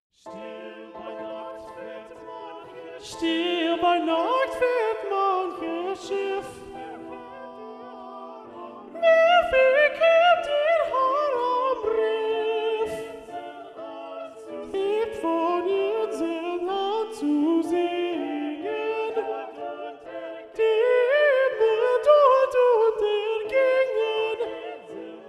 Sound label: Soprano 3 Predominant; Womens; Robert Schumann, ed. MacMullen